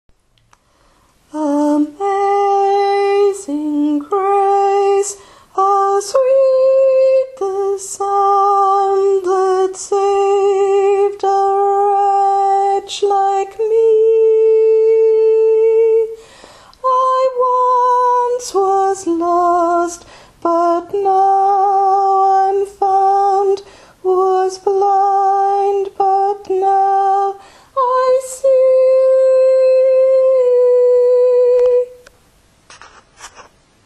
voix tenor amazing grace

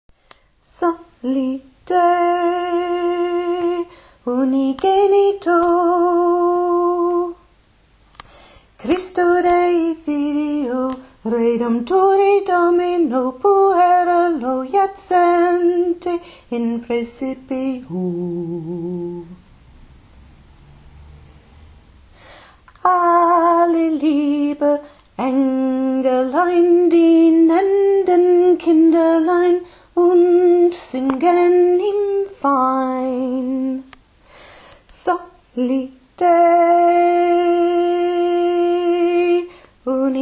voix basse psallite chant entier

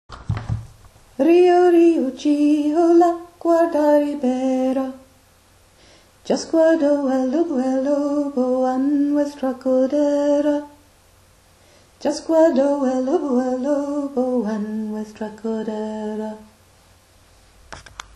voix alto

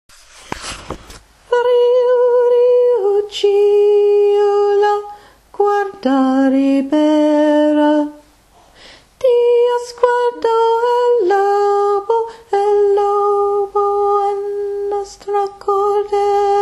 voix tenor version lente

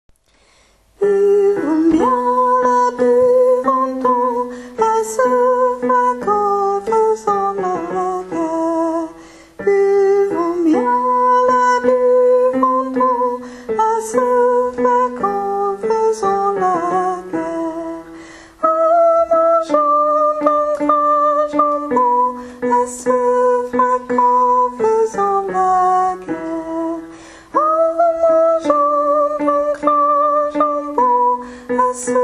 tourdion tenor chanté plus haut

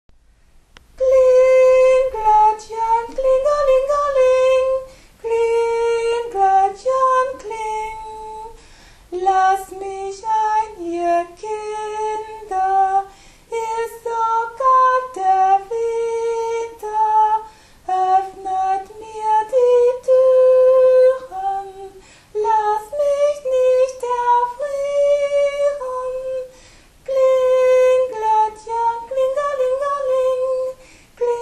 soprane

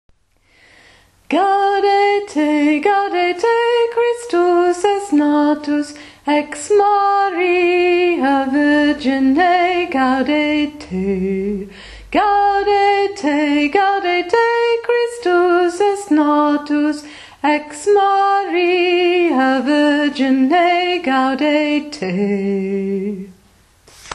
voix hommes; gaudete

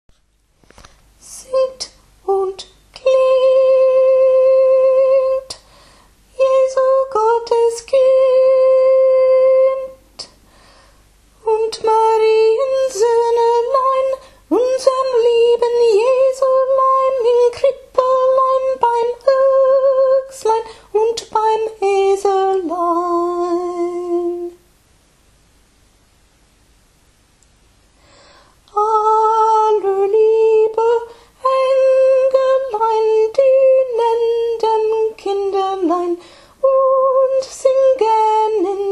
voix tenor psallite partie en allemand lentement

